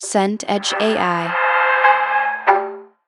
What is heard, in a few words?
tension mid